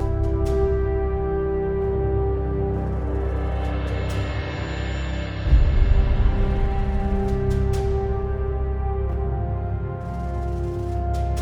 MUSIC Summoner Vic LOOP; lol

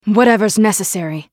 attack; Akali

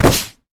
electrical surge; LoL; kennen

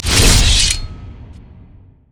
dragon strike; LoL; jarvaniv

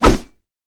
basicattack; pantheon

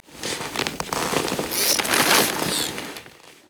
hermit idle fidg

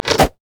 blue mech melee basic attack; LoL

